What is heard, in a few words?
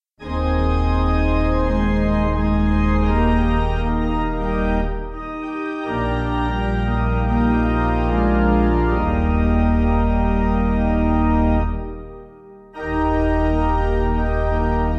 Pipe